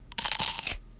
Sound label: crunch